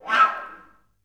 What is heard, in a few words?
FL FX, Orchestra